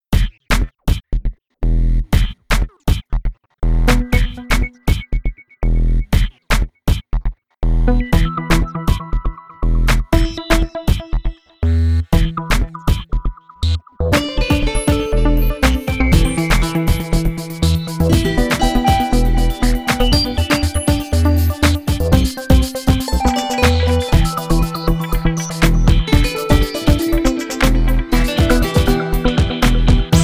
Hihi, EDM; 리듬

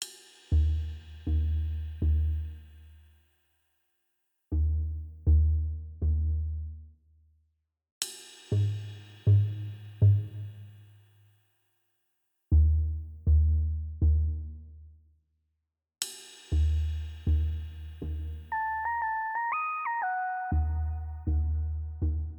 Simplicity pluck; Drama simple

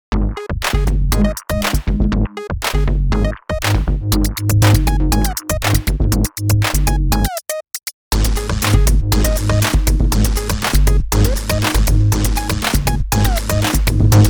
Electronic, EDM; 리듬

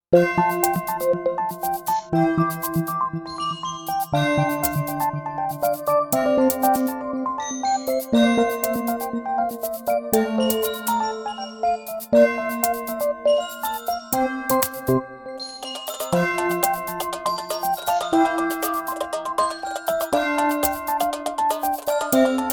Christmas Style

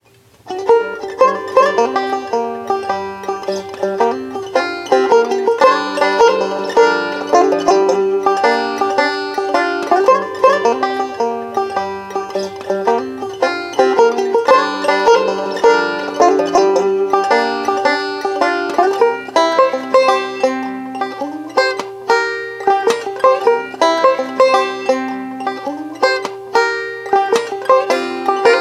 Solo; Benjo Solo; Comic 드라마

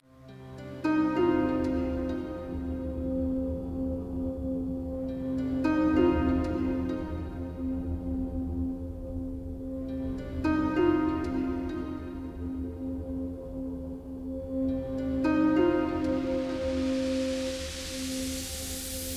Deep Forest, 미스테리 리듬

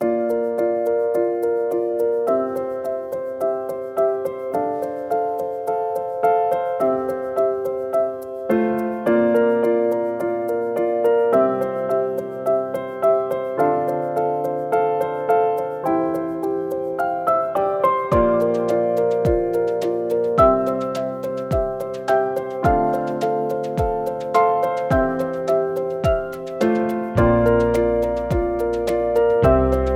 Moving, 감성

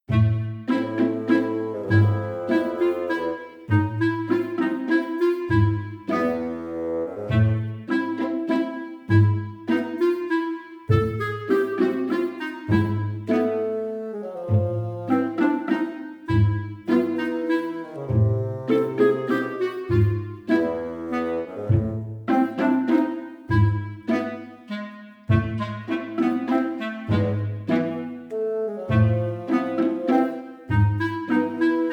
Clarinet, Comic Slow

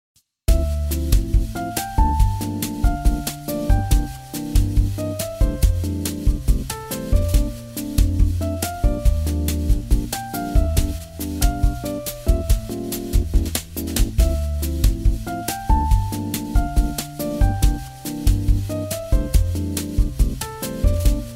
Bossanova

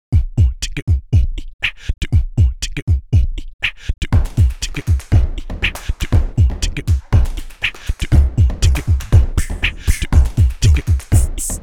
Beatbox

